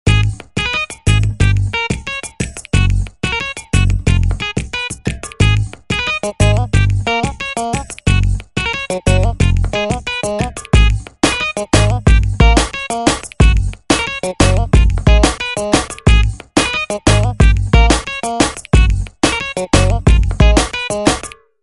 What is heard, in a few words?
Perc, hiphop